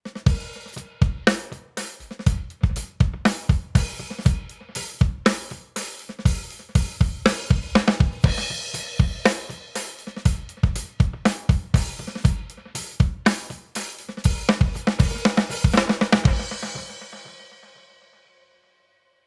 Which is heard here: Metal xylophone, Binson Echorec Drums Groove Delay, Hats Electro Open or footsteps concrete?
Binson Echorec Drums Groove Delay